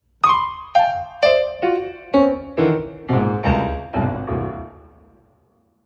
Piano Gestures

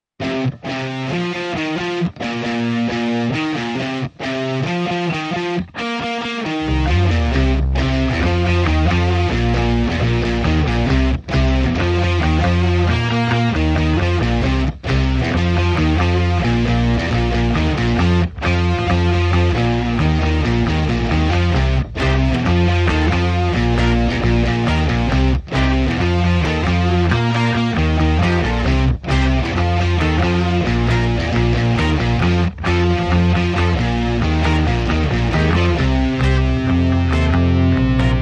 No Drums Reduced Arrangement

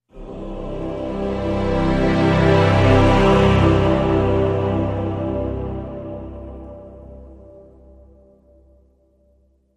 Epic Growth C Major

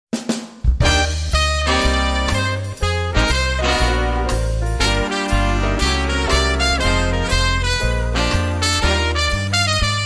Jazz Brass

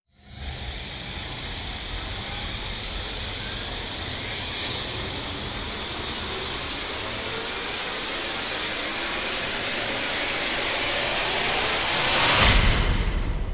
Rise Long Downdraft

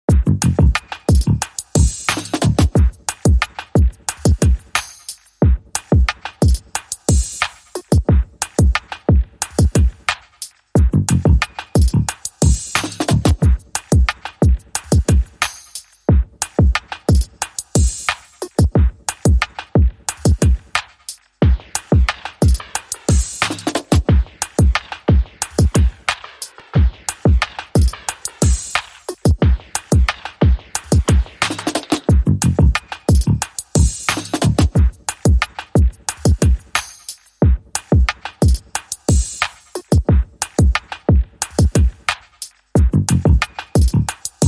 Drums and Bass

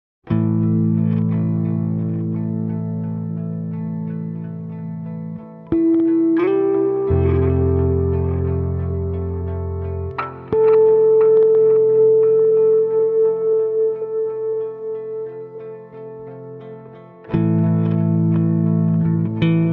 Forest

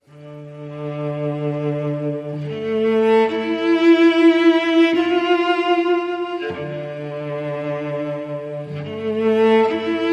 Cello

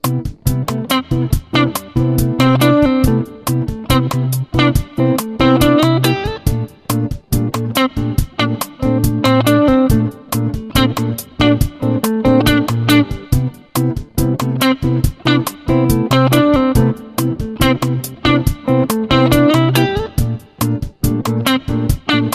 Bossa Blues

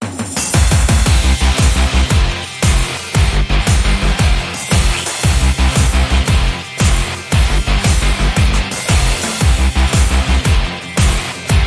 No SFX No Vocals No Synth Less Claps Mix

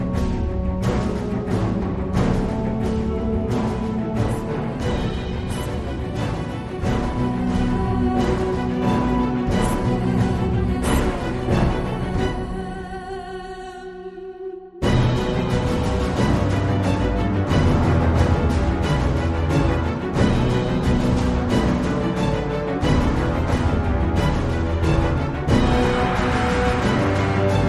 No Choir

